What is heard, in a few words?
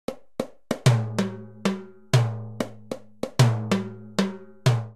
Guaguanco 2 Timbales 190bpm; Latin Percussion; Ableton; Factory Packs